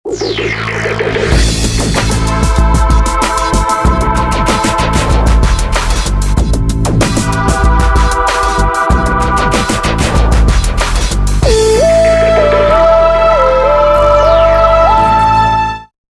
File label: jingle; raiffeisenonline